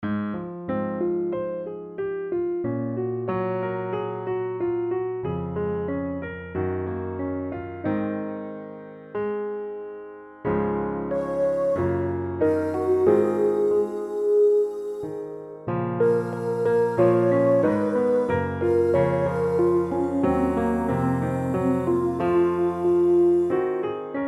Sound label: Piano Study Db LOW